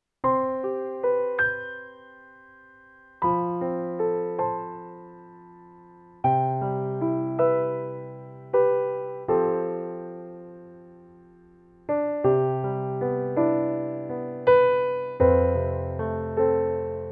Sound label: Alesis Recital 61 Acoustic Piano